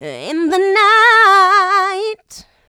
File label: Female Vocals; Houseworx